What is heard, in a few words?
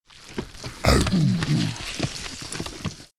zombie attack